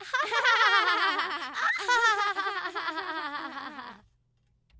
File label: Women Laughing